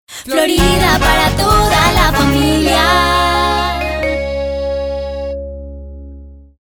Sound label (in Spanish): audiologo completo